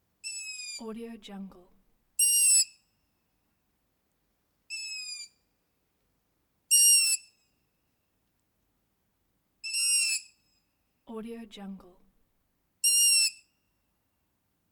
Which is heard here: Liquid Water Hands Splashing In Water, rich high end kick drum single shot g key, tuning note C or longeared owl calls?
longeared owl calls